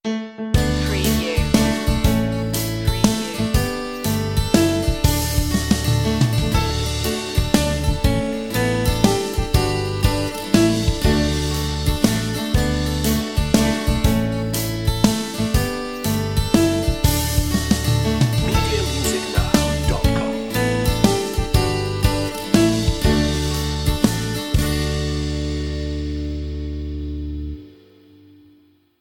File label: happy birthday to you instr